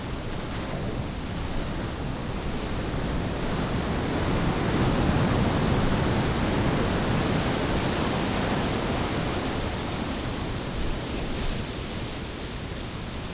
water land; Pirates Of The Caribbean

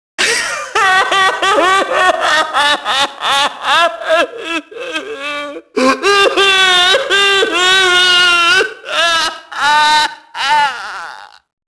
junkie noise; enemy; Max Payne

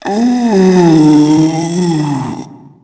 Tiki Chant; Finding Nemo; XBOX

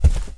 chair Drop; objects; Star Wars Obi-Wan; XBOX

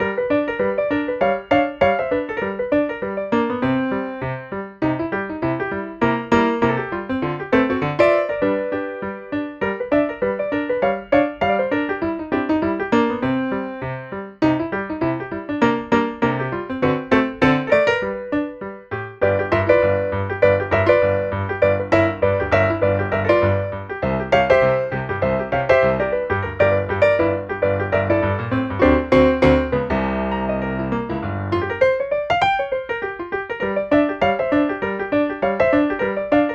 piano, music; Kung Fu Chaos; XBOX

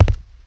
Walk Ceramic; Player; Footsteps

